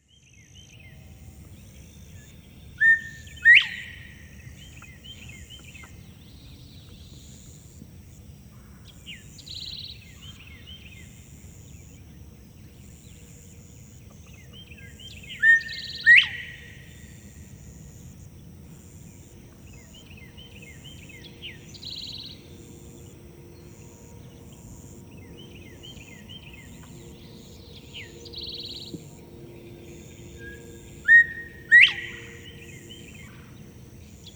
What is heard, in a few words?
northern bobwhite song; paul marvin